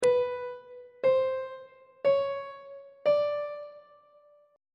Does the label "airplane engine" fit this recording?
No